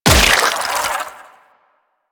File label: Loot Crate Cyst Burst; Ambience; Warframe; Infested Eris